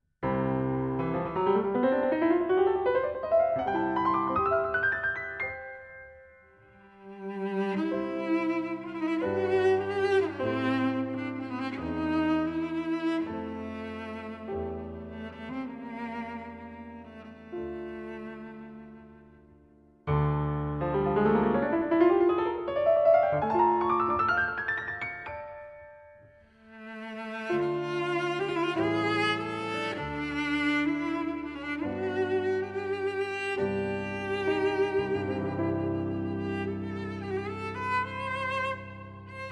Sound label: cello and piano